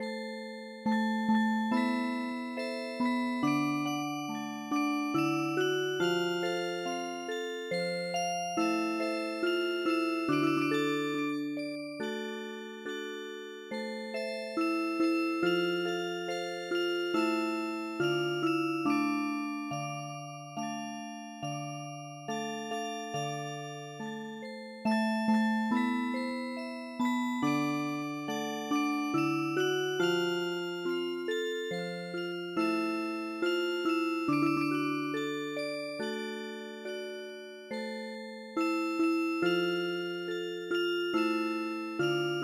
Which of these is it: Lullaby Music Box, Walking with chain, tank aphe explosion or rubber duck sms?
Lullaby Music Box